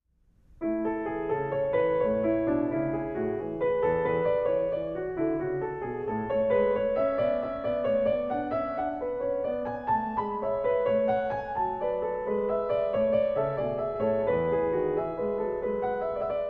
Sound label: Prelude And Fugue No 19 In A Major BWV 888 Praeludium, Clavier; Johann Sebastian Bach